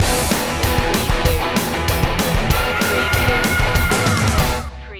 metal driver

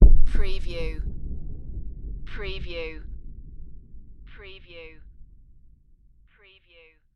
Bomb Distant; sound-effects-bible

